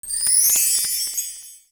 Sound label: Perc; AMG; Perc One Shots